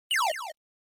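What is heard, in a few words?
Game Boy General Pitch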